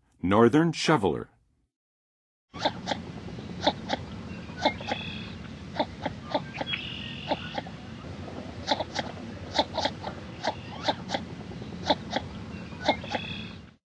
Northern Shoveler; North America; Western USA; Western USA Songbirds

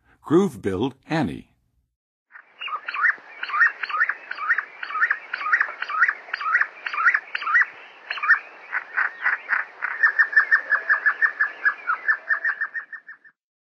Groove-billed Ani; North America; Western USA; Western USA Songbirds

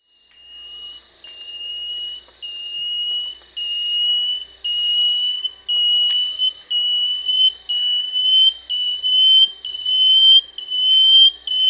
Banded Antwren (Dichrozona cincta), Peru; S. America

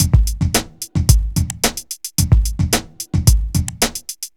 r n b kit 110

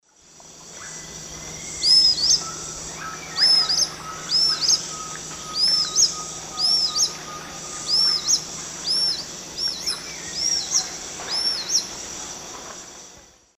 Harpagus bidentatus, calls; S. America; Eastern Lowlands

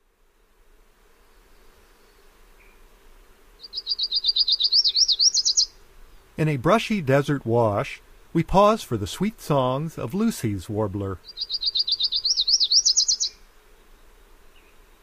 Lucys Warbler, Bird Sounds; North America; Common Western Species; Know Your Bird Sounds

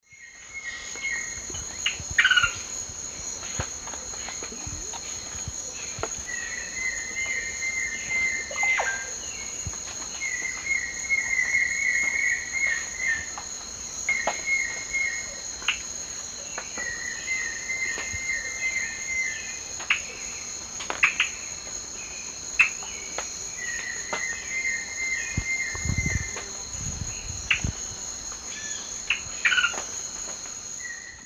Poecilotriccus latirostris, song and calls; S. America; Eastern Lowlands

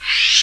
whoosh, space

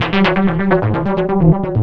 Synth, Techno

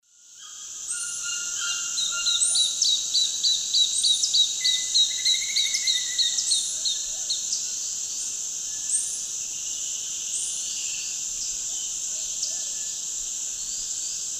Veniliornis affinis, call; S. America; Eastern Lowlands

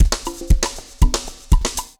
dancefloor perc 120 bpm; loops

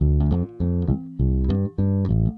Stingray, Rock Bass; Old Grey Stingray

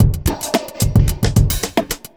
evil beats 110

